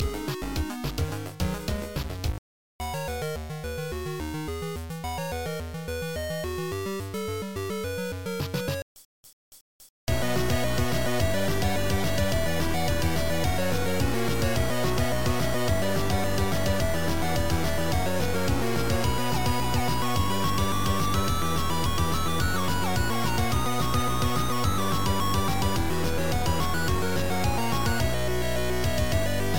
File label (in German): schlager